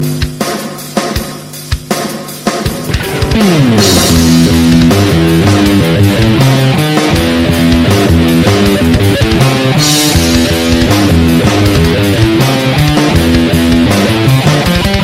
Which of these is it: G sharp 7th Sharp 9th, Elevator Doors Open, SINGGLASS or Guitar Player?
Guitar Player